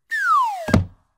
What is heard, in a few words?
Falling Sound Effects